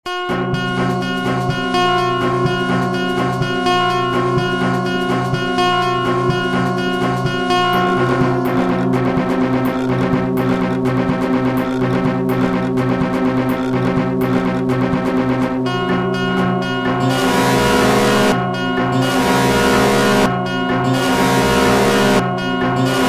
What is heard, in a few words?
Horror music